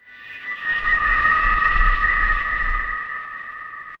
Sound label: magic scifi alien